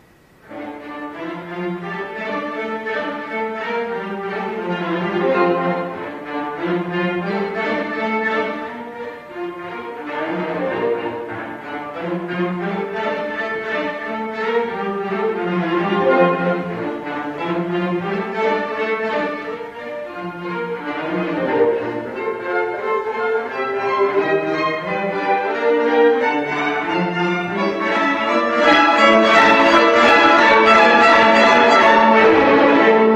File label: quartet; Death and the Maiden